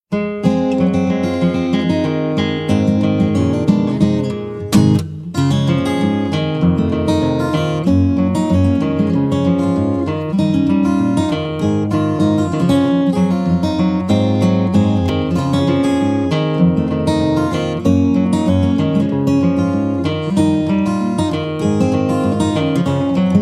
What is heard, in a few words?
victory rag